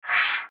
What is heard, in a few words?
slide; Lighting Hot